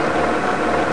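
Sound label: tank engine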